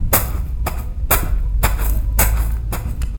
walk gravel

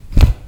book close

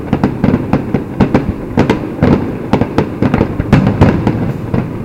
fireworks loop

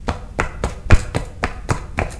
run sandal

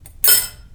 fork

